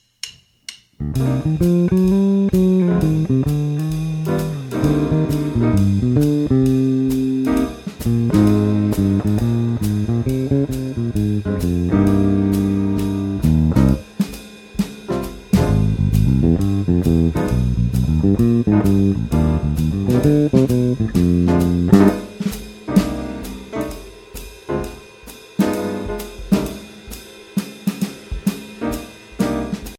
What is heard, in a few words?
C Minor Blues Solo, jazz bass